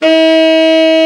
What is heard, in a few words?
BRA TENOR, SAX; Best Service Gigapack 1; BR SAX 2